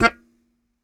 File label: Very Short Note, Melodica